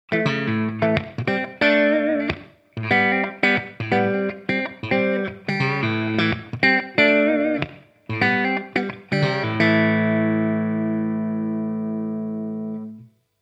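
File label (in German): Pickups Seriell Clean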